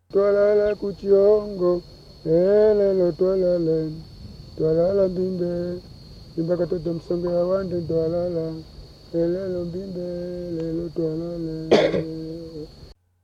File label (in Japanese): トゥワララ・クチホンゴの歌唱旋律; africa